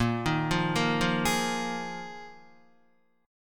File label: A sharp 7th Suspended 4th down Guitar 4E 4A 5D 5G 5C 6F; A#7sus4; arpeggiated